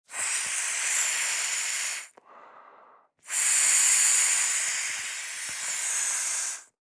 Rubber ring inflation blowing; inflatable

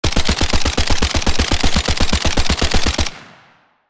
FN SCAR assault rifle full auto fire; gfxsounds

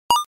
Game coin collect

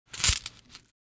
Casino win small bag of casino coins

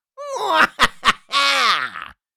Mwahaha laugh little fantasy creature; fantasy friends

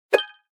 Designed whoosh transition

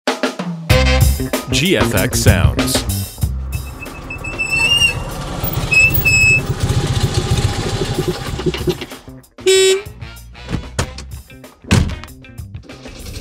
vintage car; vintage car sfx pack

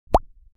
Cartoon pop up

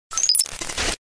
Error glitch

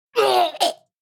Pain grunt anime villain vocalization

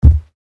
Footsteps on carpet boots single step

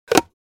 Wood jewelry box close

